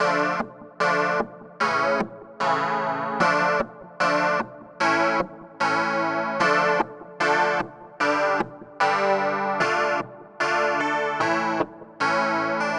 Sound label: Clavinet Vibey Hip Hop Chords Dm 150; Sample Banks; Melody; Keyboards